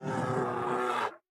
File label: Death